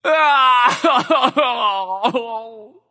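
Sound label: nonverbal boohoo; smite; voicepack; merlin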